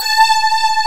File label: digi decay